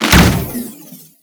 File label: shield hit; skbt astro fleet